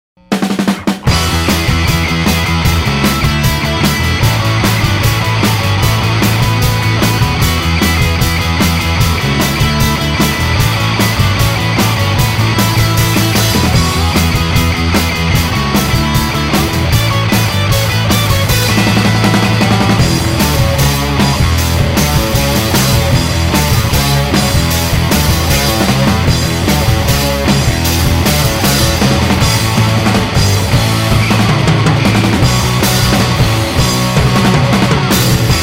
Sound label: Chord Crusher